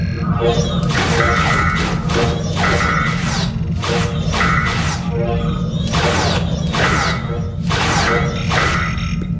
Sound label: Target Practice; trek